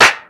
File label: Clap Filter, Ensoniq Mirage